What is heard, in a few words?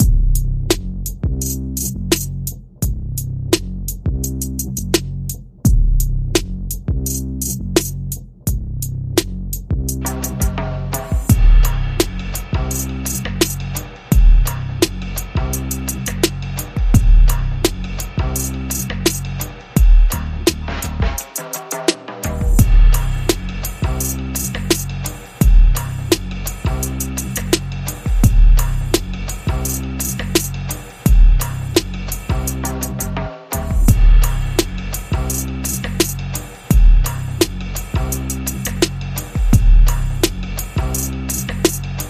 Drumjazz